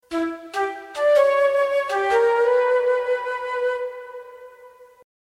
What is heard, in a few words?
flute low